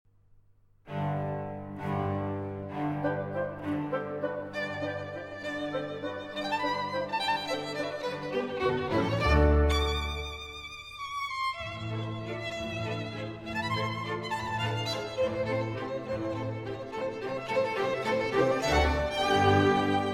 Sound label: Violin Sonata in G Major, Allegro Animato; Violin Sonata No. 2; Op. 13